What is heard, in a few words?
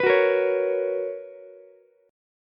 B Major 7th Suspended 2nd Ukulele, chord; BM7sus2; strummed; JGuitar